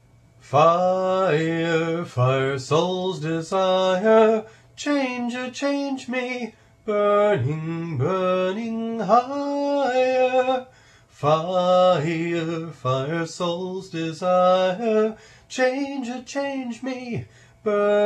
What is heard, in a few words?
fire; sweat